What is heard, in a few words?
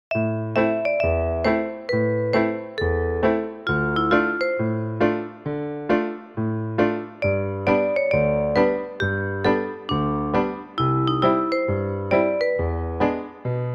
Newage; 밝고 맑은